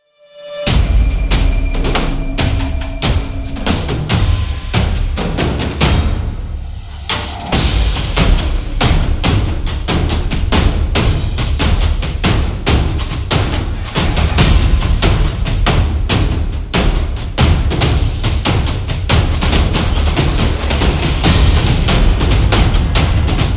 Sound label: Drums Tribal; tribe